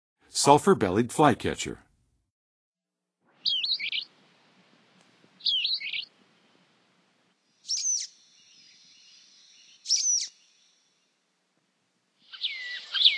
Sulphur-Bellied Flycatcher, Songs of Birds; North America; Western USA; Western USA Songbirds

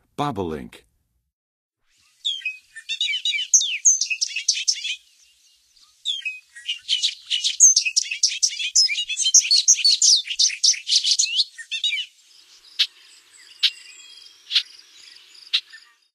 Bobolink, Songs of Birds of North America; Western USA; Western USA Songbirds

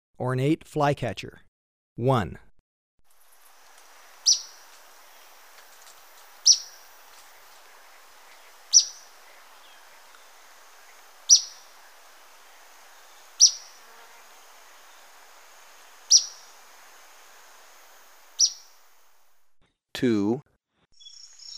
Ornate Flycatcher, Birds of Ecuador; S. America

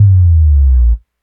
Bass, Breakbeat

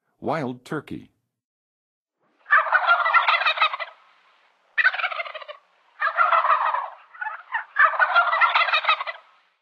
Wild Turkey, Songs of Birds of North America; Western USA; Western USA Songbirds

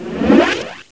whoosh, space

